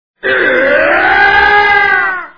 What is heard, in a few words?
eugh; shogun